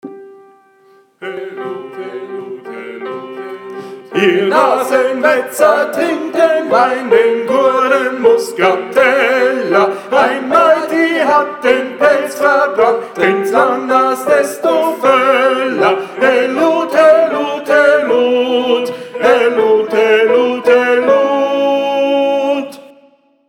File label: TENOR; Gesang; Helut ihr zecher